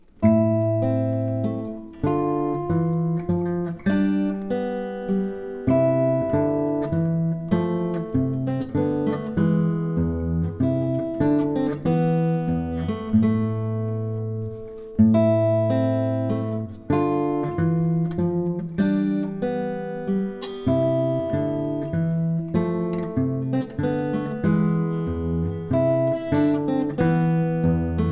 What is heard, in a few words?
Minuet classical, Gitarre